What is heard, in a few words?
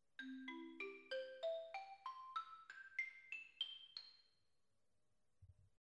Xylo; 高敏感